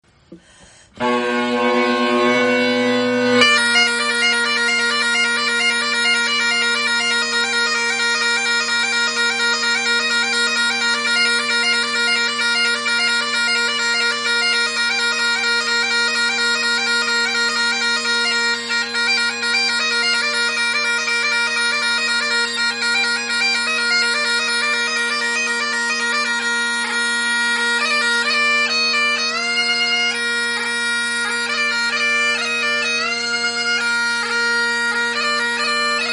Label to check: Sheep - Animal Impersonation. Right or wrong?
Wrong